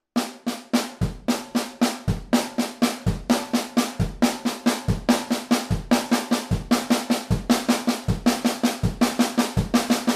break passe partout, batteur

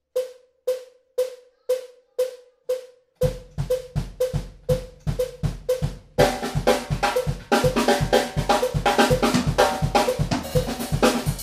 solo bikutsi

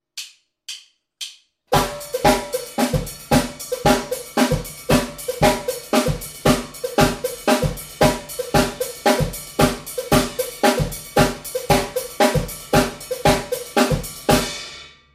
bikutsi 12 et 3 simultannée

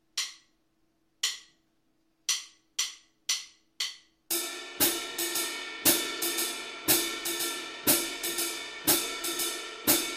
swing medium triolet

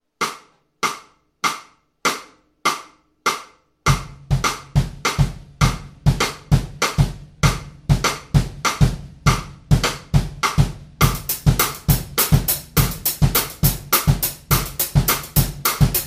bikutsi 3 4, batteur

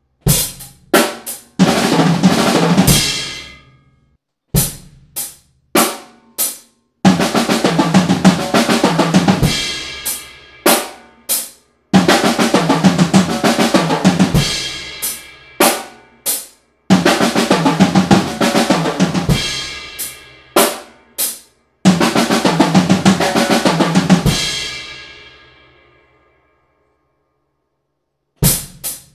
break passe partout triples croches doublé, batteur

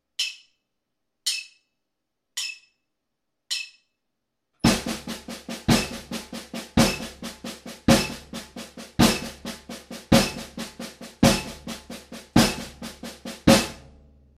quintolet doubles croches

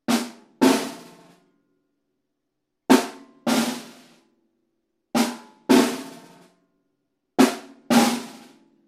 jungle 2 caisses claires

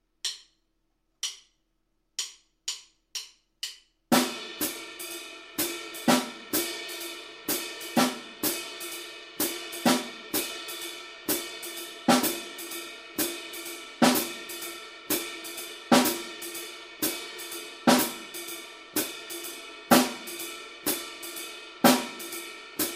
swing indépendance caisse claire

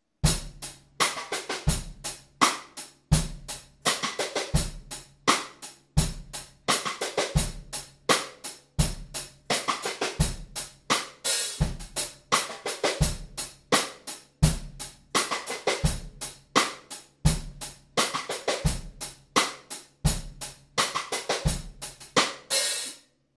rim knock hauteur

